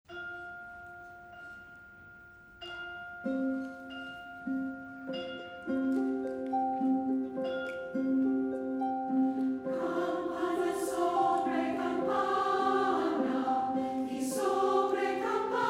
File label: chorus; Campana En Bele